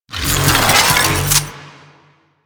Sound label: Short Bow Charge; Warframe; Duviri; Dax; weapons